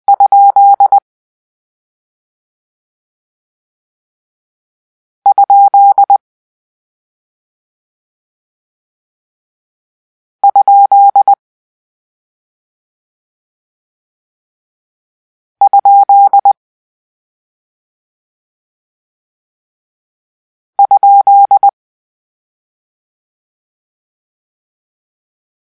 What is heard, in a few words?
Question Mark, morsecode